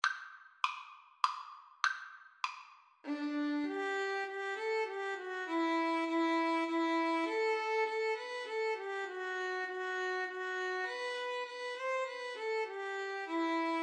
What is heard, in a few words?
Violin Viola Duet